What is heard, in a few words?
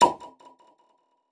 Stamp; Puyo Puyo Puzzle Pop; iOS, macOS, mobile